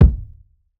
Kick

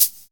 Hat, Closed Hats

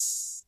open, Open Hats

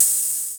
Closed Hats

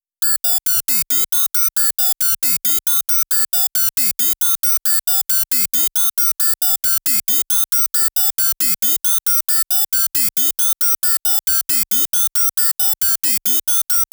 Arp

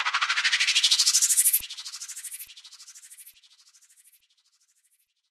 Rise

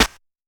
accent snare

